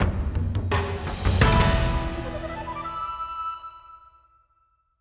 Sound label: Result Eliminated Game Over